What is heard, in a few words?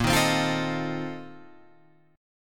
Bb Major 7th Suspended 2nd Suspended 4th, Guitar Open F, chord; BbM7sus2sus4 strummed; JGuitar